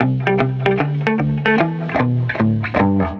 150BPM Guitar Clean E min; Antidote Advent

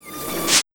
Big Beat Drumloops, SPEED 140

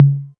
tom mid, Novation Drumstation

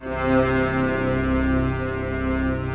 STR, YAMAHA, Analog; Analog Fantasies; USB Soundscan vol.49; AKAI

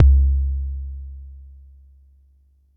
Bassdrum; Jomox Xbase09

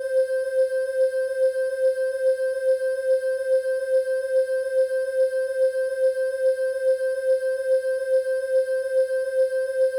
Chorus, Yamaha DTXpress; drum machine

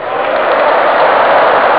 crowd; speedball2; Amiga Soundtracker Sample Packs

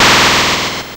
Atari Noise Long, Atari 2600

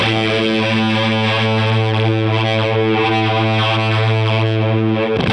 Hondo Fuzz 90 A, guitar drone

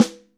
Tama soft; Best Service; Real Mega Drums VOL-1; WHGM KIT 4

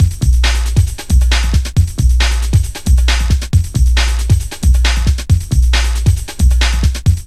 LOOP, Tekno Industrial; East West

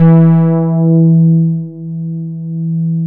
MODULAR E4P, MODULARBS

